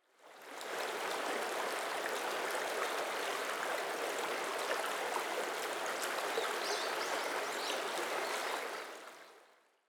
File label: river short